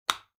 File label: handbag lock